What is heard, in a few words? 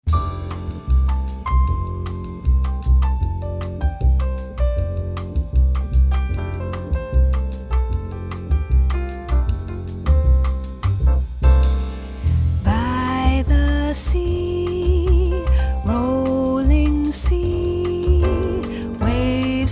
Pentatonic Waves Live; distance learning resources